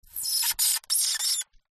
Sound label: vibrations cello bow styrofoam cooler short; sound spark